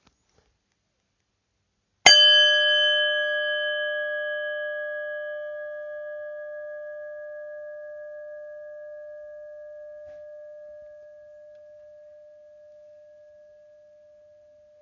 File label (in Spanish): campana